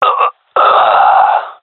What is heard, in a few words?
death; Counter Strike; CS:GO; seal diver